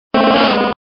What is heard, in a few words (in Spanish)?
Grito de Gloom